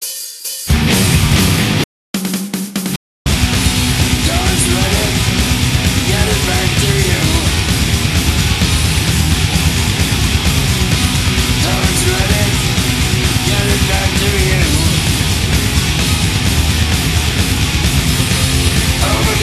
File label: spin Music